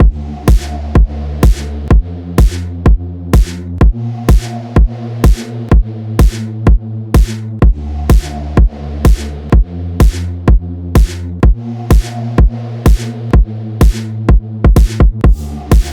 workout music gym tabata songs zumba dance workout remix